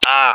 MINOR PAIN; cash

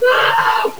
rax howl; cyrax; raxx

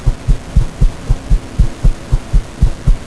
fan

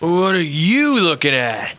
drunk

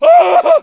fall scream; cop

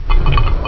step

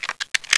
shotgun reloading

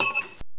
hammer pickup

